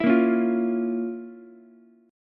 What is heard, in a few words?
F Major 7th Suspended 4th Sharp 5th, Ukulele; strummed; 9,10,9,8; JGuitar